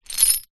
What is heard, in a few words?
metal small chain drag short concrete ground; foley